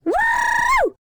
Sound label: Woman Woohoo; Zomboid; Lifestyle